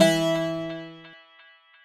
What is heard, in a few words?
baglama mi; baghlama